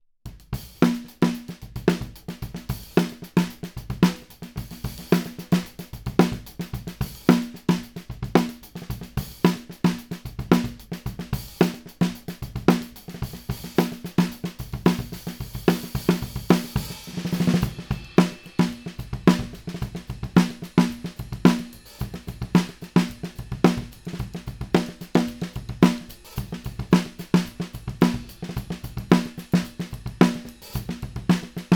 SNARE FET

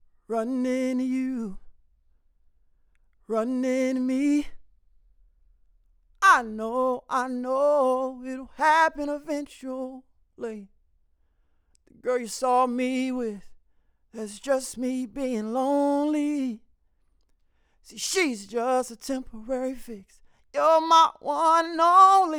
MALE VOX

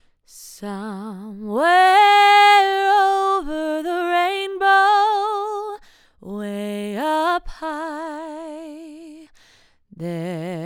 FEMALE VOX FET

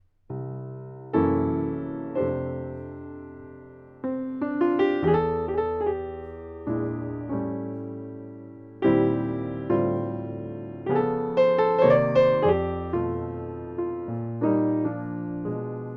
PIANO FET

